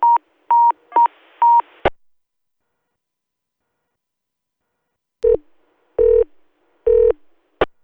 Tone Beep

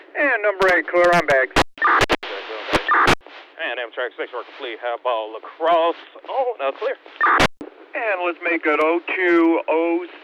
Amtrak

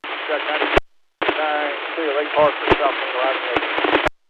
Arriving, CSX; Lakeland

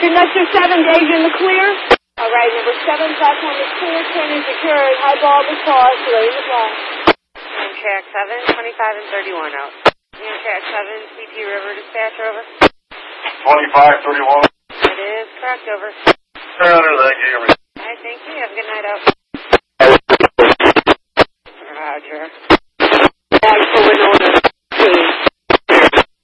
Amtrak

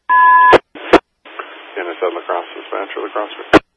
West Salem Tone

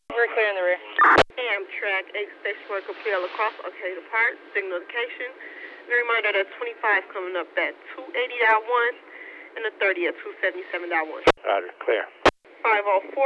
Amtrak

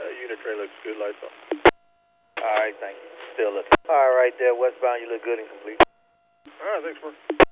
Unit Train Roll By

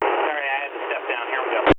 Step Down, Amtrak

